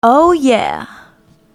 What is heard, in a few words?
Vocal, Infinite Soul; Sonic Foundry (Sony Creative Software); Vocals